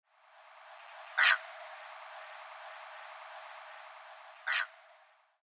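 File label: kosagi, Little Egret; コサギ; 威嚇; 兵庫南西部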